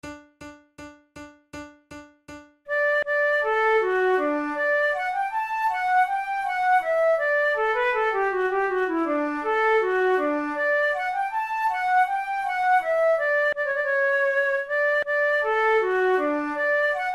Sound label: boismortier op 22 no6 les bourgeois du roule, flute